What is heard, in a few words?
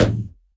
Elektron Monomachine, Drum Machines